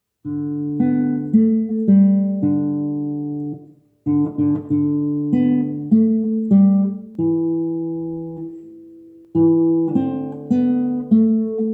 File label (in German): Jingle Bells Gitarre